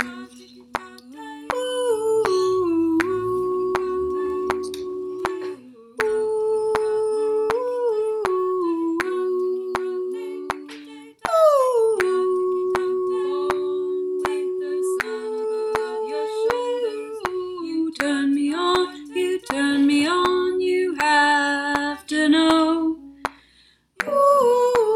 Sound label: greek song - sops